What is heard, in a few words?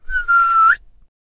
whistle attention